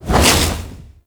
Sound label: Double Jump; Warframe; Wraith